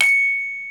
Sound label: BRONZE, Bronze Gamelan; E-MU Formula 4000 Series Vol. 4; Earth Tones